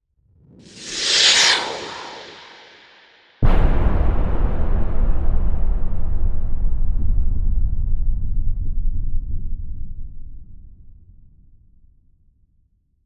Missile, Whoosh sound of missile with explosion.; Blasts (Sound Effects:Impacts)

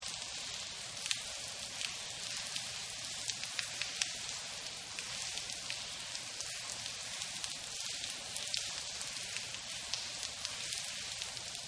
Rain Med Concrete; Weather; Partners In Rhyme

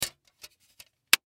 Cd Jewel Case Slim, Cd Case Slim Thin Close Dvd; Cd Jewel Case Slim Thin Close Plastic Dvd; Foley

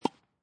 Tennis Hit Ball with Racket Distant; Tennis (Sound Effects:Sports)

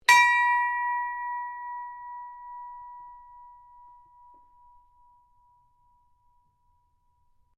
Ships Bell Ring; Various ships bells; Hmas Shropshire; Boats; Vehicles; SoundEffects-Foundation4

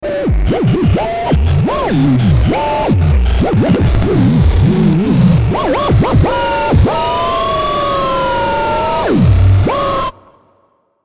Electrical Frequency Sweep Warble; Production Element Imaging Element Accent Transition

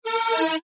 Chime Transition Multimedia; Web Interactive; Technology; Chimes; Blastwave

